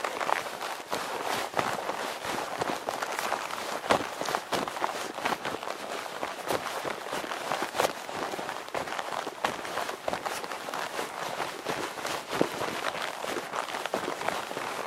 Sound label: other run, clothing system